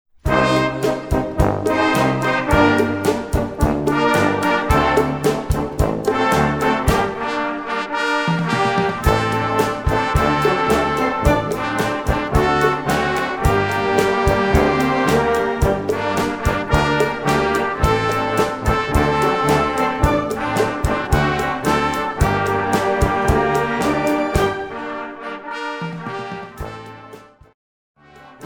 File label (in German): Rumba